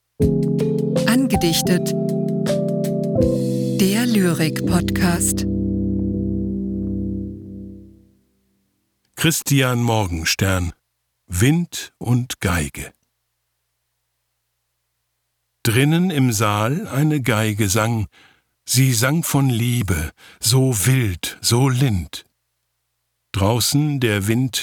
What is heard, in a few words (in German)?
Wind Geige